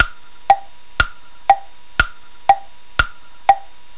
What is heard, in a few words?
Tick; tangochaos